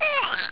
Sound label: SPLAT